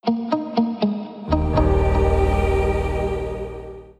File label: Soundlogo